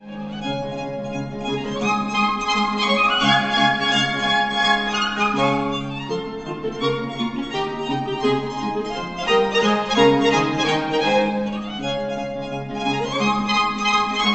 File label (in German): Galopp; Franz Schubert, Arr. Alfons Egger, Estrella-Verlag Wien; Grätzer Galopp